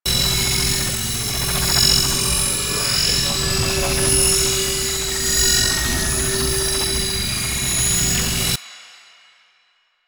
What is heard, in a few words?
Fuel Projectile Idle Loop; Warframe; Jade Shadows; Ascension